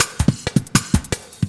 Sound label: LOOP SD, 160 BPM